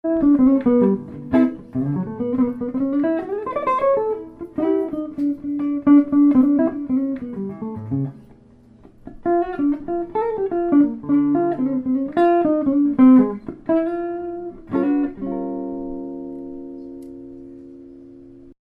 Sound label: peerless journeyman 5362 natural